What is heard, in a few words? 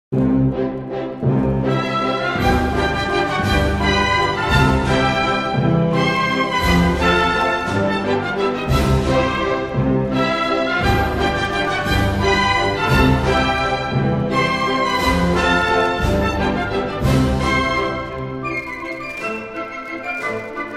Don Quixote Male Variation